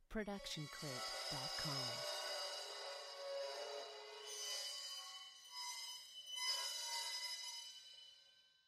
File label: hi hat 14 inch scrape; soundscrate